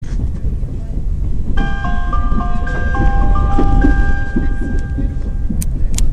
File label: chime; nisitetsu